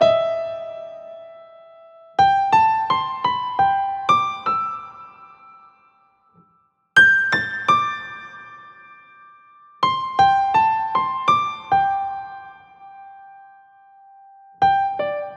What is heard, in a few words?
Piano Solo